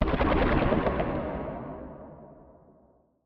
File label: Monster